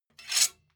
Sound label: sword scrape